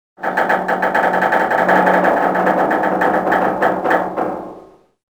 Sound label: metal stress, ambience; Soldier Of Fortune II Double Helix; XBOX